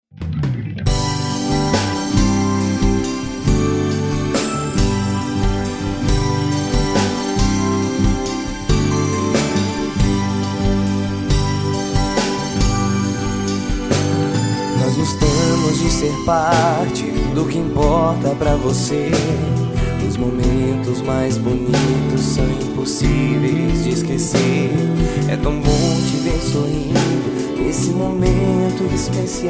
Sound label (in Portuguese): jingle center natal